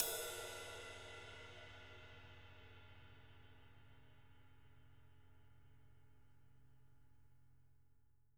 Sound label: rides; JZ RIDES; Living Drums; Sampleheads; Peter Erskine